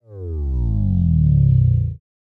Bass Drop; SoundsCrate

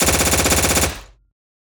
Assault Rifle; SoundsCrate

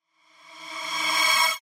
Magic Shriek; SoundsCrate

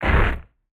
body impact ground

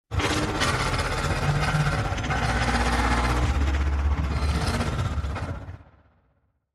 metal beast; soundscrate

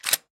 gun cocking slide

